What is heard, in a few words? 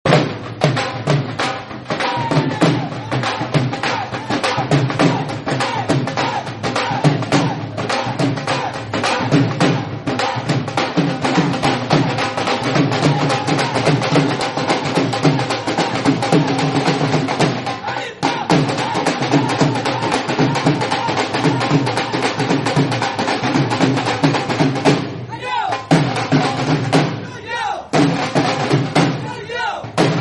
Punjabi Dhol Bhangra